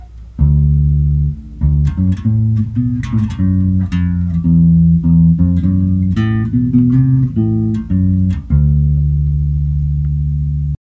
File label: FULL BASS AND TREBLE